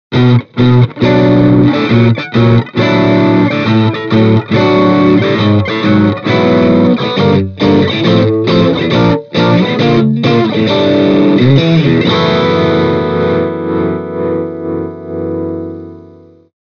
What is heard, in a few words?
Overdrive, Stratocaster; Mini Rox Mic Inside